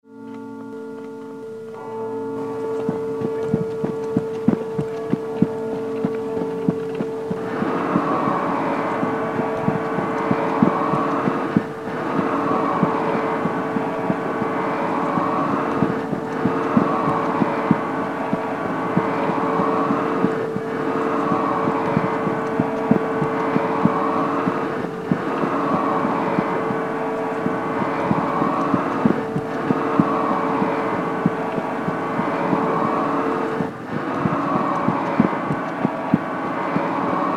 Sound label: Bells